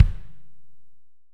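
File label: AMB KIK, ambient kick, studio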